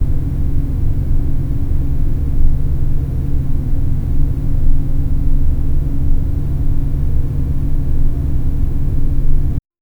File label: empty apartment room tone